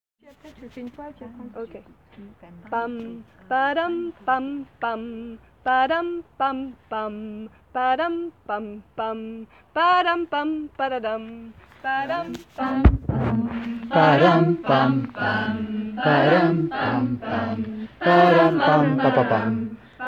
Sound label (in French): intro basse